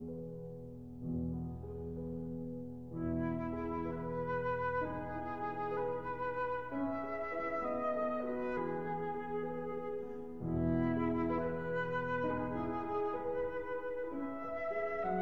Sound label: Berceuse (Lullaby) for flute and piano